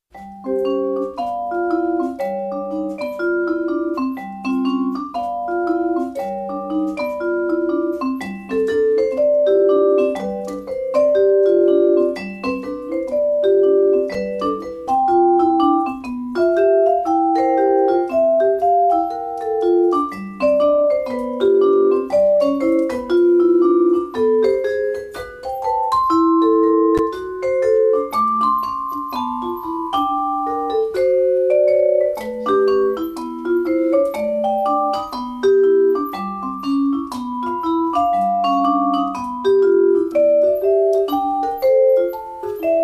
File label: Jazz Vibe Boogie